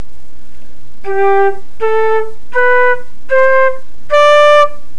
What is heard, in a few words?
Dull tonging, flute